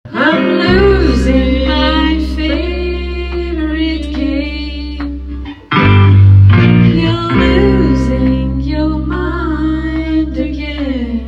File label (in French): Travail Chant